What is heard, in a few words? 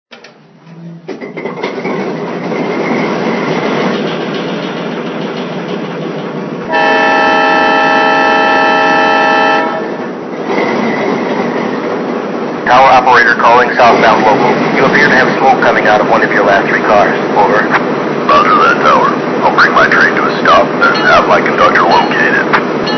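ALCO RS-3, locomotive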